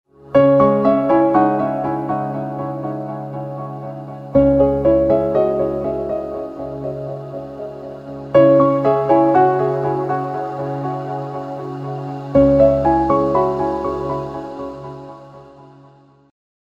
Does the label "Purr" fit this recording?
No